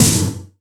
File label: SD, Yamaha RY-10, Drums